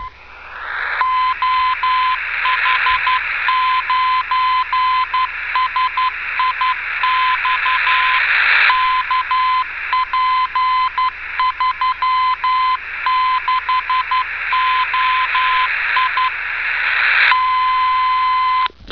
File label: beacon, VHF Radio Aurora